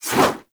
Melee Whoosh; Warframe; Sentients; Brachiolyst

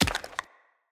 Acorn Drop

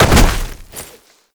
Land Heavy Dirt, movement; Warframe; Uriel

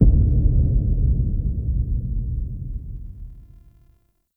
Bone Pit Cinematic Impact; Warframe; The War Within; Cinematics

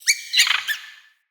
Summon Squeak, Animals, Vocals; Floppet

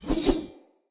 Dagger Spin Whoosh; Weapons; Enemy; Sinecure Dagger

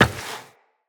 Step Rock, Footsteps, Walk; Tenno

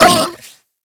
Feral Vocal Pain; Warframe; Kubrow

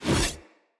Axe Whoosh Heavy; Weapons; Enemy; Axes; Glades Axe

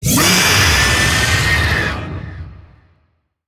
Vocal Attack; Warframe; Zariman; Void Angel

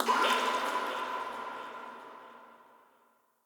Coin Toss Land; Level Events; Midrath

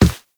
Run Default, Footsteps; Warframe; Characters; Operator

